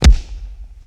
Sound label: Mega Body Punch, Hand To Hand Combat; Sony Pictures Sound Effects Series